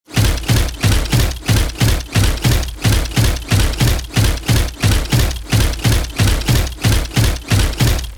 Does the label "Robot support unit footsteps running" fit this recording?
Yes